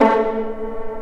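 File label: Vio pizzicato A2; Digital Sound Factory Virtuoso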